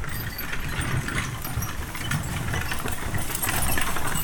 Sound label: Horse Drawn Wagon; Electa Quinney